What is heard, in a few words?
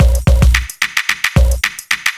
block rock kit